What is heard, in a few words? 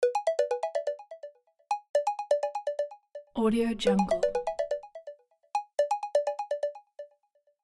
incoming video call